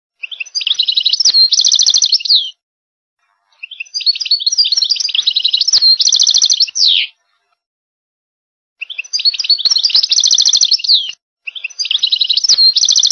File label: goldfinch